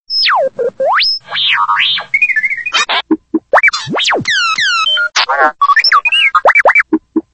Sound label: robot